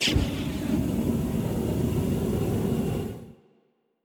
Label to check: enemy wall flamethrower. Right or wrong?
Right